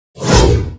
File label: ATTACK